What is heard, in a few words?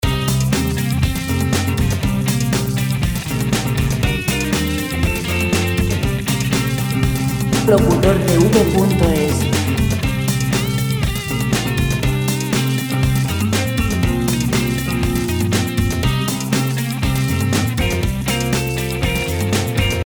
musica libre californiana 120 rockguitar; guitarra; Royalty free guitar music